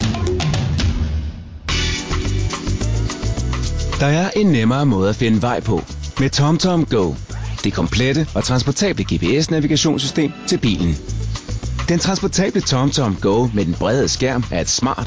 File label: tomtom